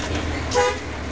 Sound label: horn; hino